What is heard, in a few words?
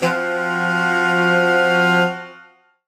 Horn Swell Alt Fmin9; undercover samples